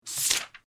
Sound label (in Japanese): 本をめくる音